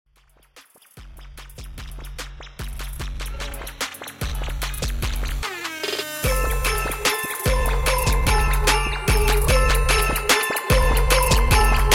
Mashup Christmas Trap 148 BPM

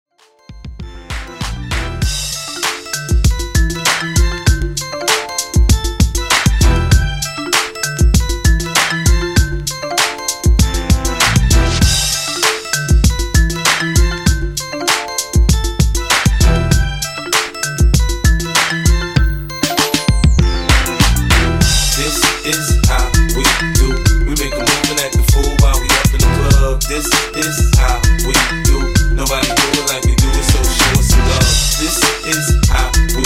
Mashup HipHop, 98Bpm

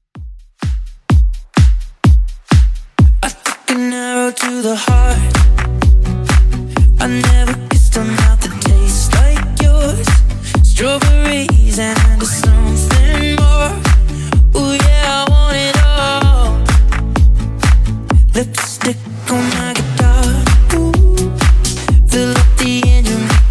bass house 127 bpm; Redrum

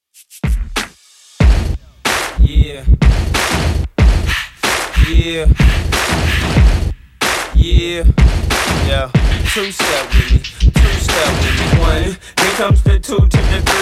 Throwback Rnb Redrum 93bpm

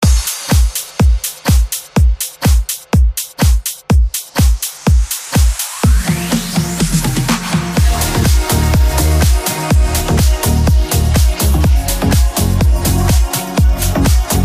slap house redrum 124 bpm

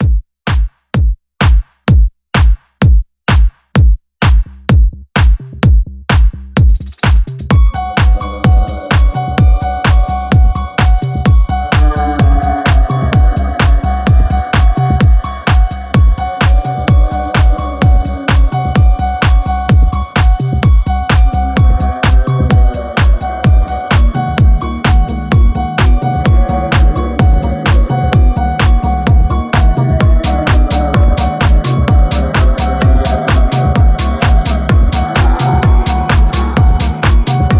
Bootleg Banger 128bpm; Hallowen

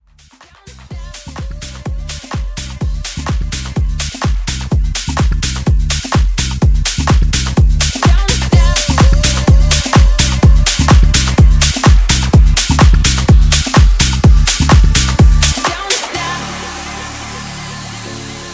Funky House Mix; Remixfreakz

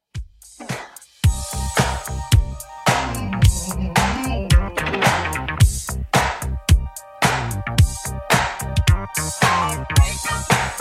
80s Redrum, 110 bpm

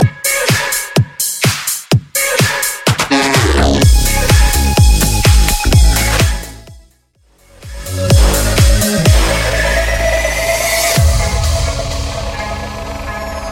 Mash Up 126 Bpm; Vegas Edit

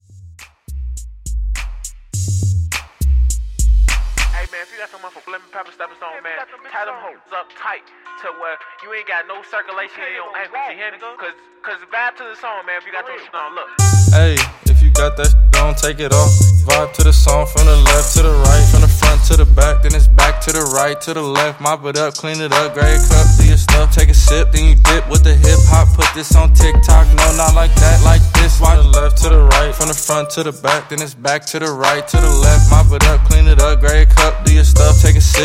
Hip Hop Redrum; Remixfreakz

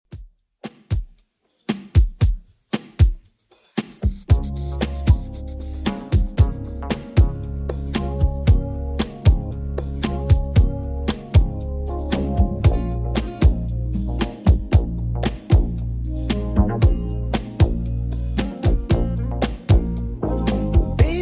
80s Redrum 115bpm

